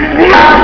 bump; grimlock

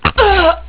fall; vortex; pretzel

